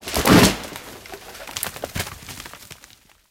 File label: tree fall; War Thunder